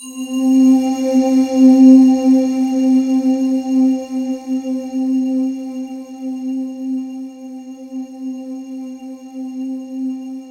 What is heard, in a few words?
C4, Synth Line